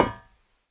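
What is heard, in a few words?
muffled ringing of a metal basin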